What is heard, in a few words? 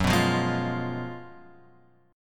F sharp Major 7th Suspended 2nd Suspended 4th; F#M7sus2sus4 Chord {2 2 3 1 X 1} strummed